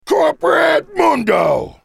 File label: ultimate effort; CorporateMundo; Character Voices - English